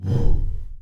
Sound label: Whoosh Woow